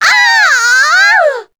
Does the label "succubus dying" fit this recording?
Yes